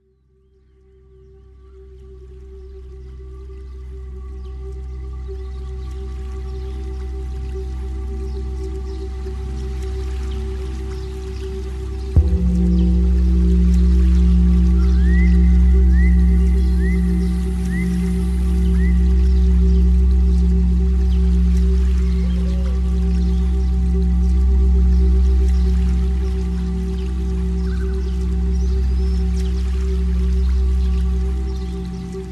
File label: 64 Hz; Die Blume des Lebens; Kosmischer Schluessel